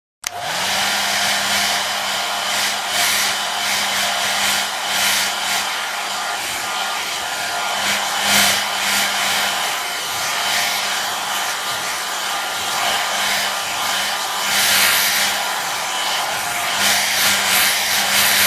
Hair drier, Electric hair-drier panning left and right.; Appliances